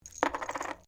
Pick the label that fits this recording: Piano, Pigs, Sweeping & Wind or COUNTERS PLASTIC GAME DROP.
COUNTERS PLASTIC GAME DROP